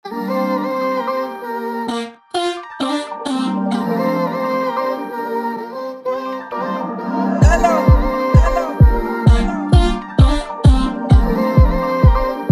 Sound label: Feel Good 130bpm G minor, beats